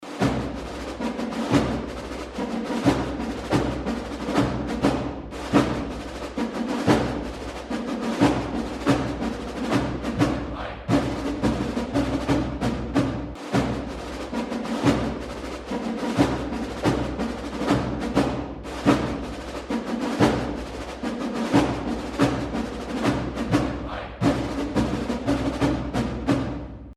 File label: Drums